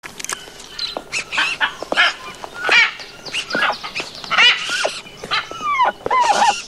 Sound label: birds squawking